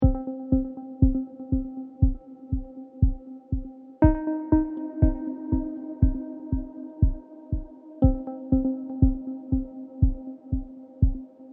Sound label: Rhodes suspense